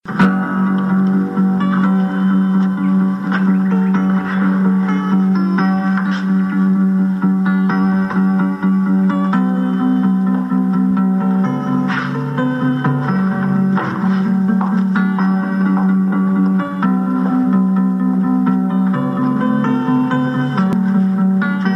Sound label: dramatic theme